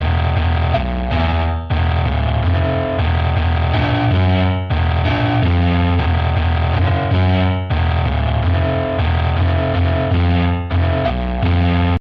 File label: piano